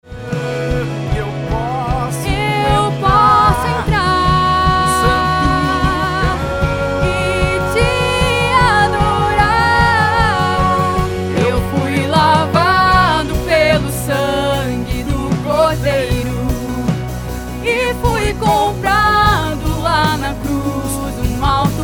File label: Gospel